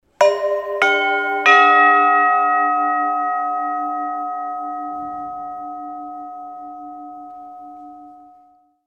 rin gongs hits; Ki11er Clutter

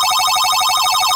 Score Count Up

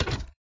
Buttonmetallic; Voices of the Void

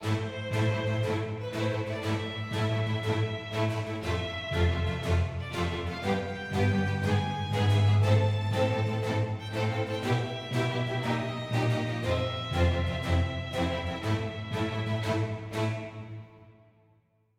heroic med

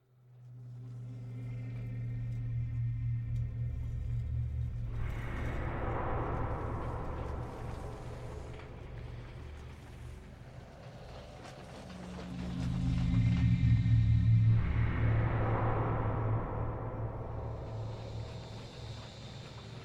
Ambient; Junk Jack; Umbra

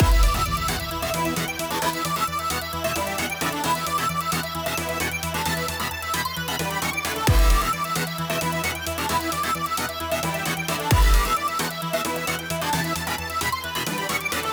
Hard Cyber Intro; metagalactic blitz

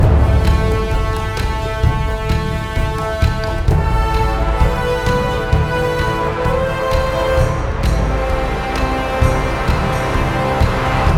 Caves Loop; Jotun

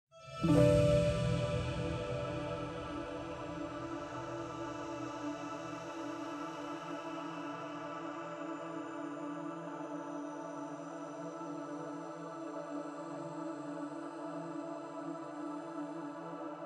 Forest Music, Forest Recording Buttons Stinger

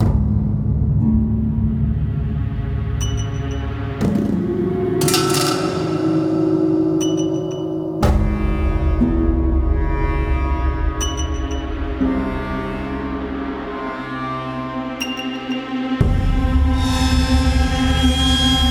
Dangerous Dungeon LOOP; Crossbow Warrior The Legend of William Tell

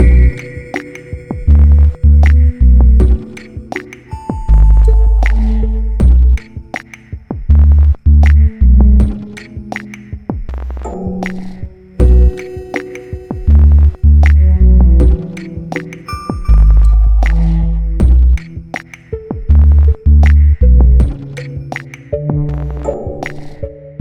stem outro; Pix the Cat

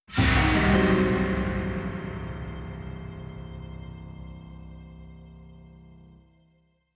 Mystery Harp; supraland

